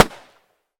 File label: gun tikka t3 battue 308 Swpph Shot Strong